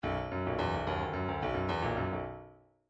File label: bdf midi file grooves funk d funk d